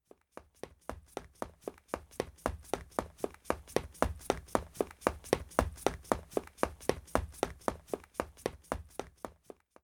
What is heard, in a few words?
cross field